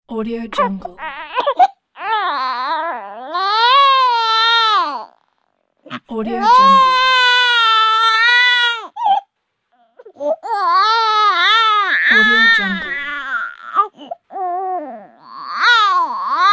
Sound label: baby crying